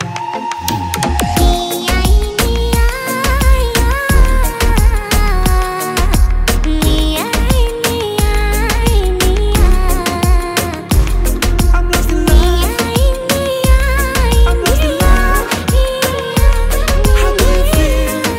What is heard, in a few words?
Music English Ringtone